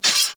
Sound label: Melee Impact Metal; Warframe; Weapons